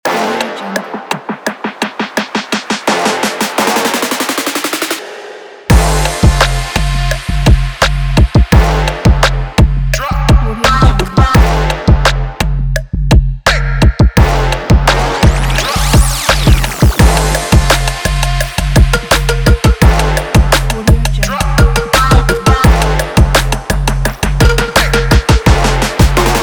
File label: rap trap trend